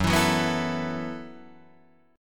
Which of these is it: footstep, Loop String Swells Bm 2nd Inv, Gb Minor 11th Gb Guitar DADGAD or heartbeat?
Gb Minor 11th Gb Guitar DADGAD